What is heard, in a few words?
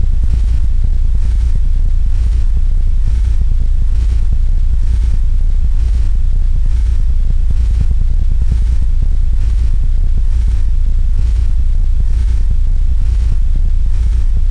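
rumble; Total Chaos Nukem 3d Pack; duke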